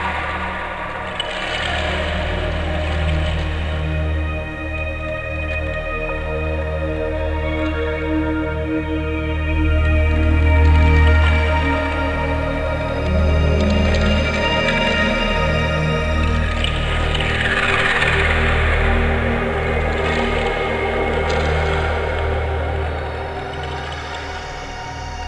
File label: Synvoxperc Scape